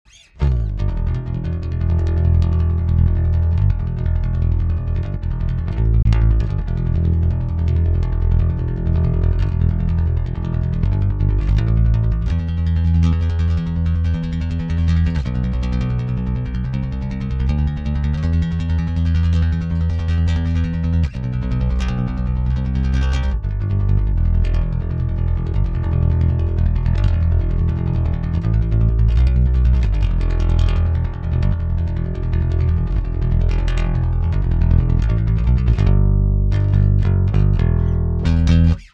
active fullboost pick metal

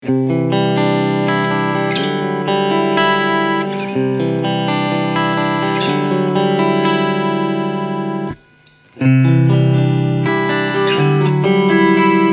Cleansound Dubs

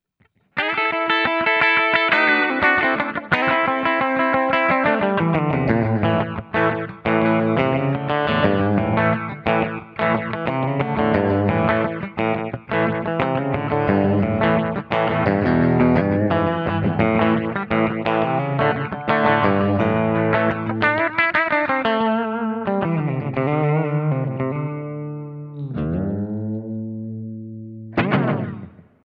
GFI System Orca Delay Digital Slap Back

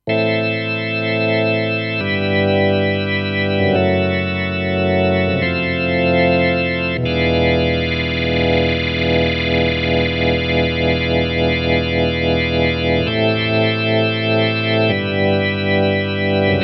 Kronos Organ

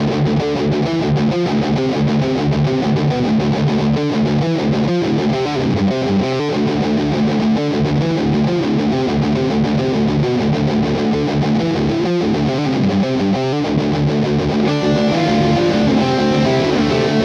Cort KX 500 Bridge Full Gain

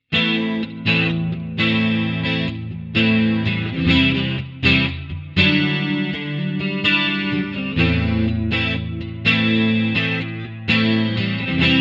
Twin Chorus; Harley Benton DNAfx GiT Pro

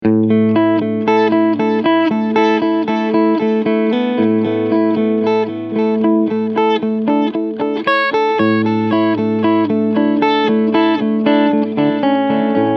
Digital Delay

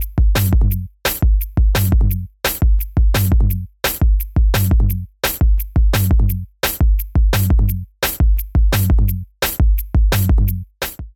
Transient Drum N Bass Loop Dry; HOFA IQ Series

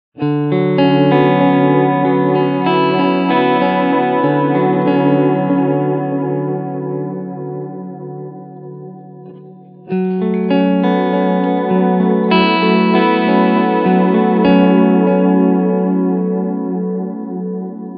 Single Head 500 ms

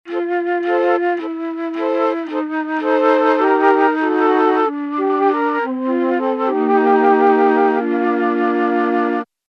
Mellotron M4000D Flute; MusicMaster

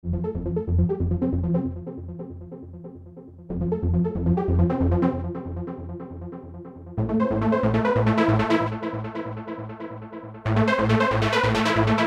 Super Saw Trance

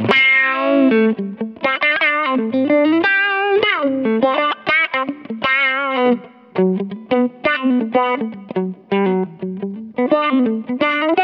Comp on Switch Bright; Auto Quack

